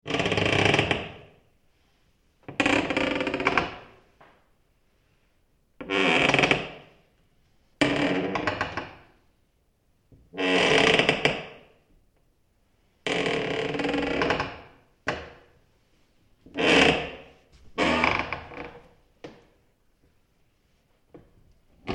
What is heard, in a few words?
Floorboard squeaks various creeping squeaks; Creaking